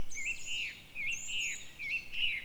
3x truu, Oiseaux

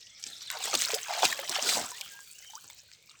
aquatic 3x ploutch; Eaux

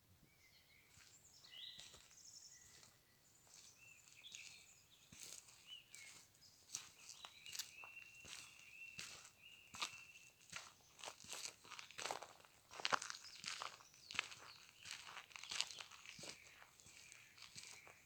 pas chemin graviers voix guturale sifflement

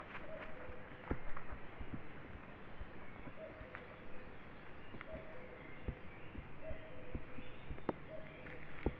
Foret oiseaux coucou au loin

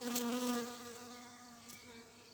Abeille Osmie partie; Vols Bourdn abeilles

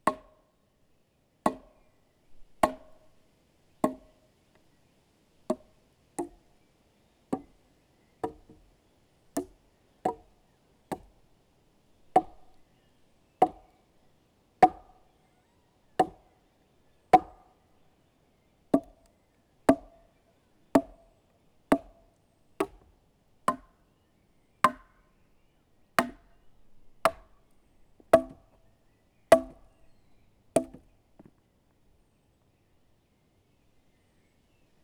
Gourdin Buches séries multiples; Percu Bois

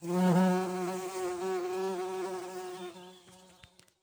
Bourdons beau massif fa#; Vols Bourdn abeilles

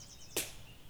4x Baton Sec cassé HF, Percu Bois

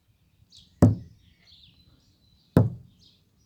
Bruitages 2x boisé; Percu Bois

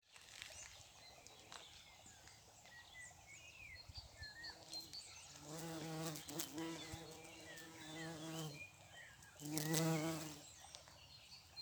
Bourdons

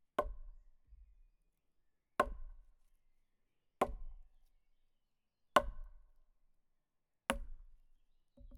Claves x5; Percu Bois

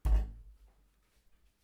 Kick poutre; Percu Bois

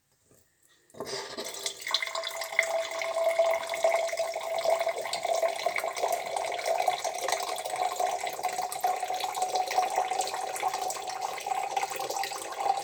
Coulis de l'eau TRES LONG montant dans evier; Bruitages; Eaux

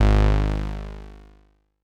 Choumgnzzz bronze basse microbrute; Percu Bois

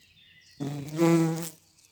Bourdon jaune quarte; Vols Bourdn abeilles

